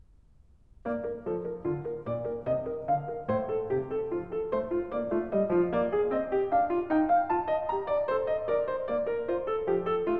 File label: Partita for keyboard in B flat major, Menuets; BWV 825 (BC L1); Bach for Babies; Fun and Games for Budding Brains